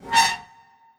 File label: Spider Squeak